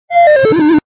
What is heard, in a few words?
lose